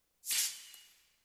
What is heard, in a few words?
Build Sound; XIV LB